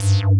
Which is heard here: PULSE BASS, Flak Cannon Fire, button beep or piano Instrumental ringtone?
PULSE BASS